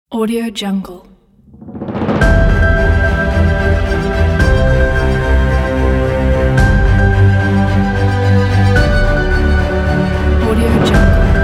epical

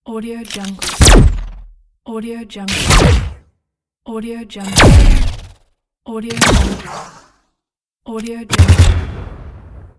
laser weapon

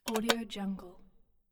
small tin box close

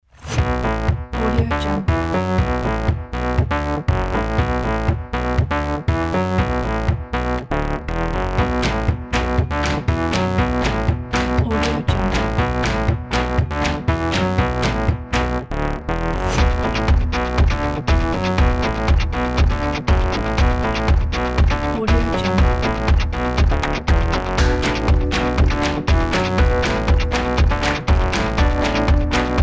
electro funk advertise

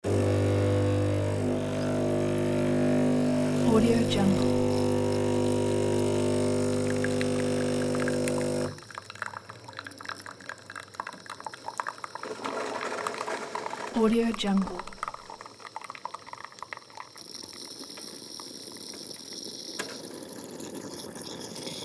coffee machine

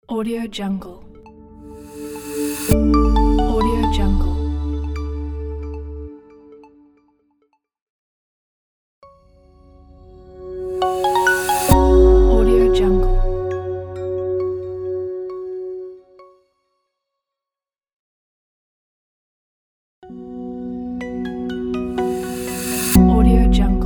soft logo pack

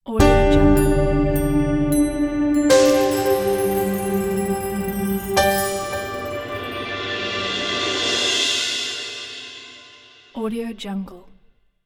ambient elegant logo

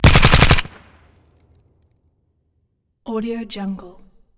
sig552 suppressed burst shot medium dry; soundmorph

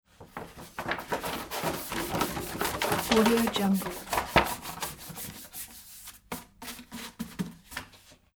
rolling large sheet of paper

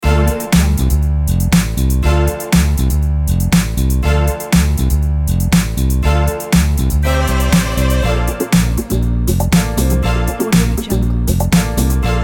underground disco birds

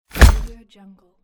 hit

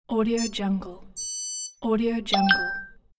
nfc payment

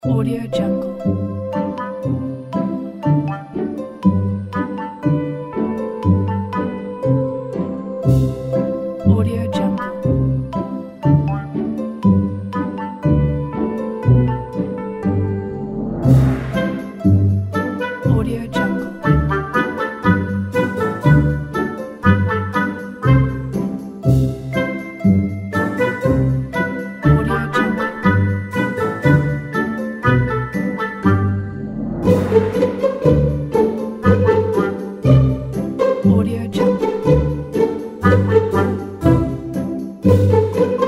cheerful comedy childrens

